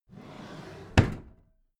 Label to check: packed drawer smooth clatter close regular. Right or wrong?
Right